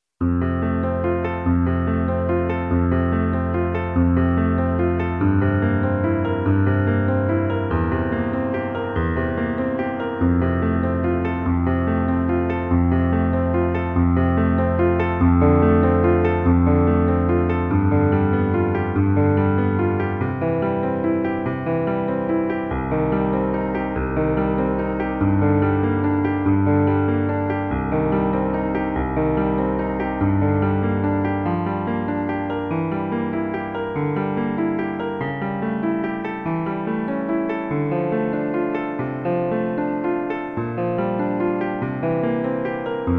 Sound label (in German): auf flugeln des gesanges f-dur low; mendelssohn